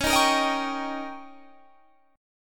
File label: Eb 7th